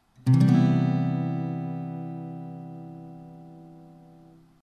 C maj7, chords

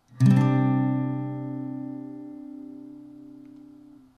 C sus2, guitar chords

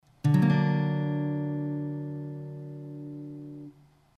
G aug, guitar chords; The A Chord Shape (Secondary)

